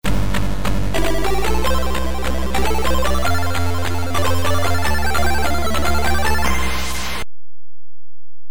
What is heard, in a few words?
stage clear; BionicCommando